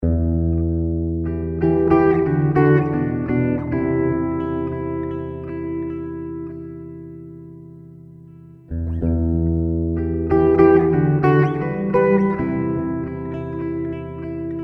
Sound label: Guitar Sitar; YANNICK ROBERT'S SIGNATURE MODEL